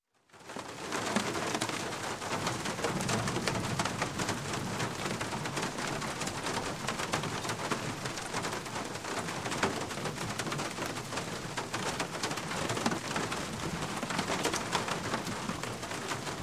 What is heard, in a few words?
Rain, Medium On Wooden Roof, Thunder Rumble; Sound Ideas 2000 Series